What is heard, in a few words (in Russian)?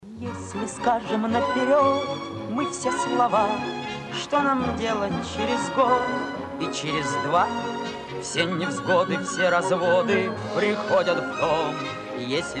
duet